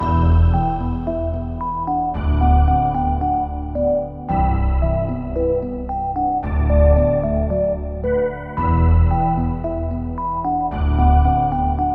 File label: character theme golem